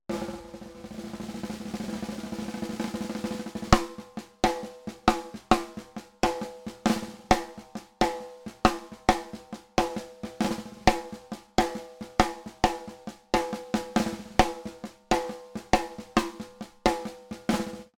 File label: rimshot